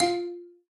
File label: Combo Sound; Candy Crush Soda